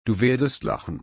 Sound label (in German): lachen